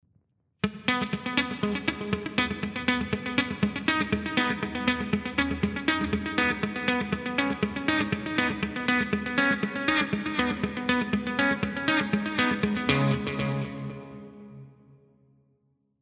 Riff sound

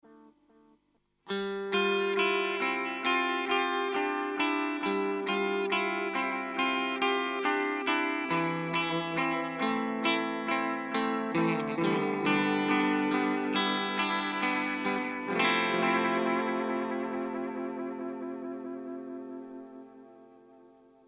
Vibrato; Chase Bliss Audio Tonal Recall RKM

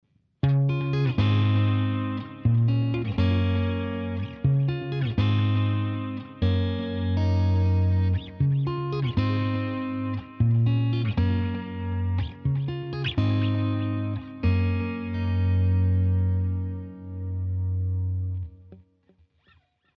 RIFF Echorec

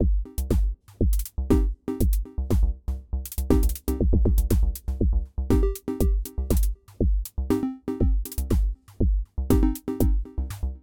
frostverb Room; sumFX

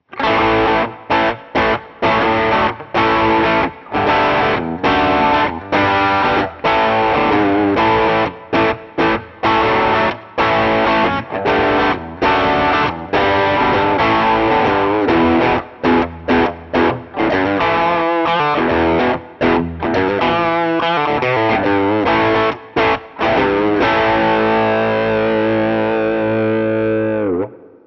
Overdrive Dark Boost; Scuffham Amps S-Gear

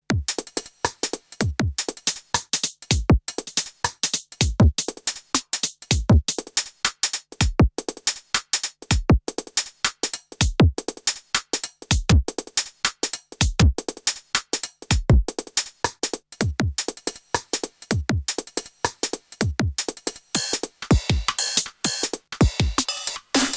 Polyend Play Random Sample Pool

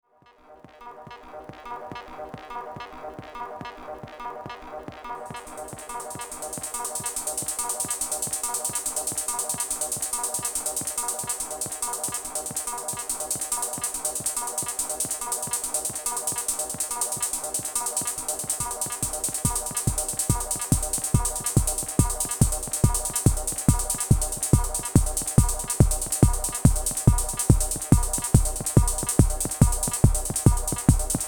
Soma Pulsar 23 Patch Diode

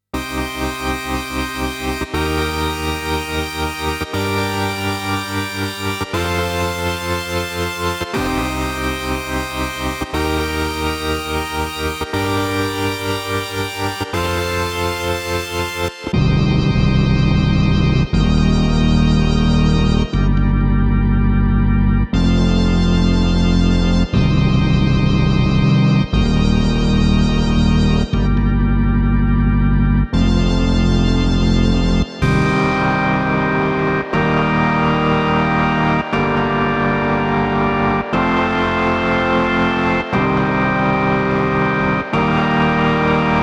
Motor Synth MkII Some Organ Presets; Gamechanger Audio

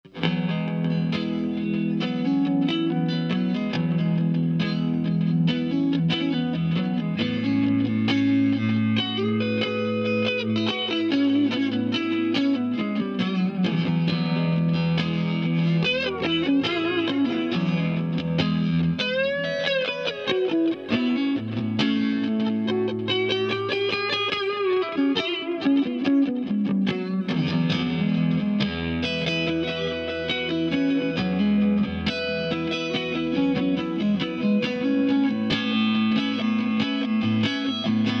Mesa Boogie F-50; IK Multimedia ToneX One; Board

